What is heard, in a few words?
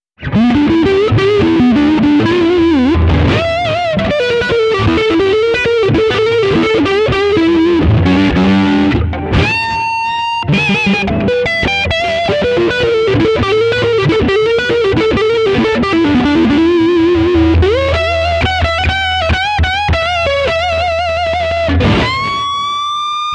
blues; vox